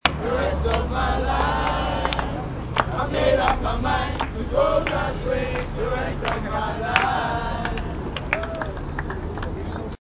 happy and clappy; at elephant castle

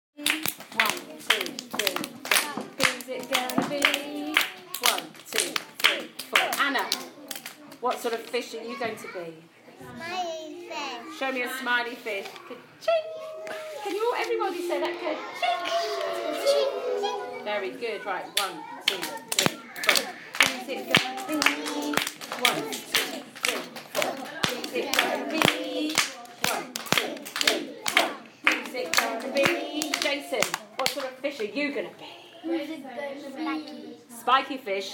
nursery fish singing

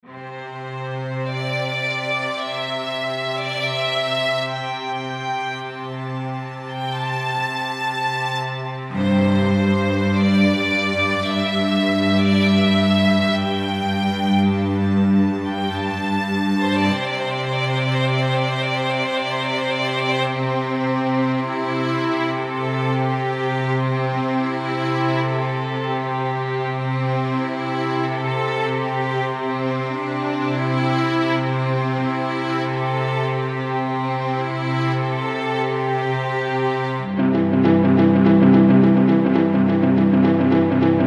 lark ascending

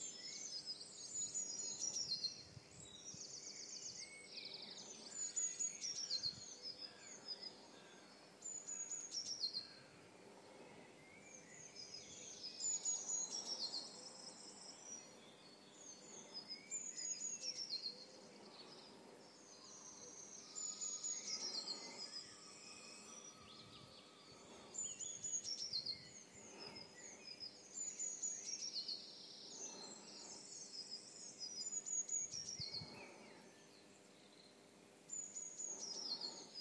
dawn chorus

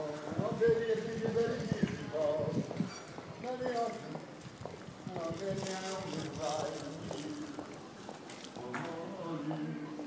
strange old guy singing in rugby

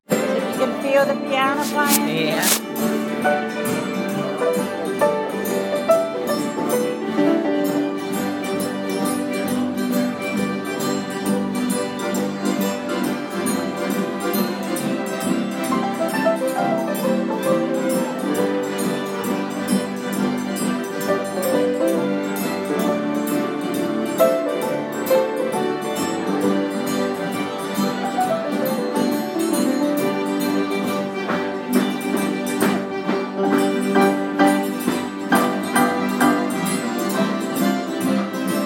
piano

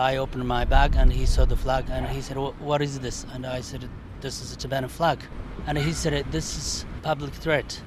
tibetan protest

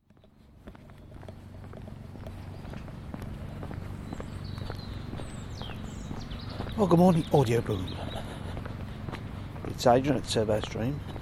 cemetery sounds a sort of soundscape

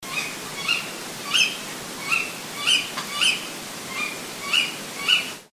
odd sounds thru your open bedroom window

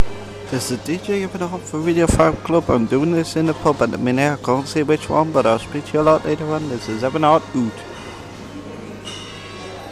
pub

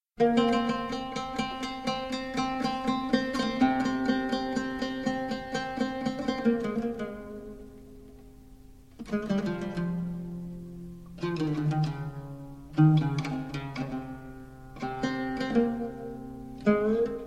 taqsim hijaz kar kurd

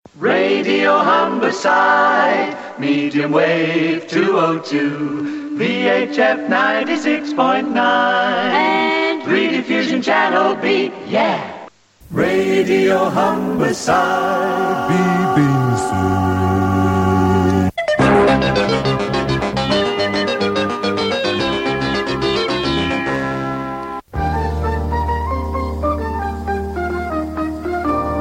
1970s jingles; Radio Humberside

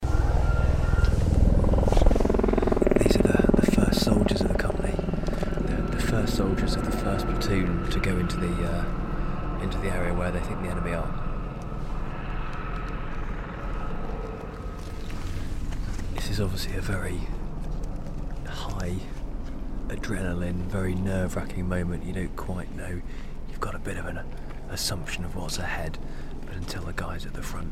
army exercise at rock barracks

